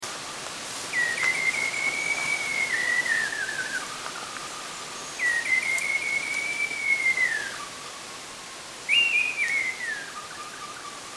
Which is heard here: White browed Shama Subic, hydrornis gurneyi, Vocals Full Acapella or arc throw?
White browed Shama Subic